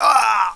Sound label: Arg; Anno